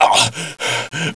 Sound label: choke; SINDEMO; player; blade